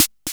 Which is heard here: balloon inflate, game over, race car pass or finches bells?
game over